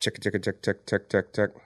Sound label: chicka chicka chik chik chik chik chik, Voice; ooh ahh